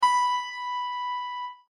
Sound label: Combo; machi koro with everyone; switch windows